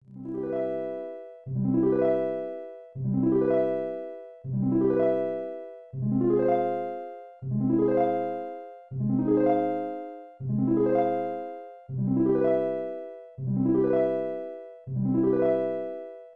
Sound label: Harp; Ultima VI; SNES